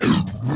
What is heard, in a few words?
enemy acid evolver spit